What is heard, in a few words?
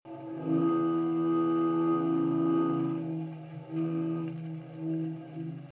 gom cello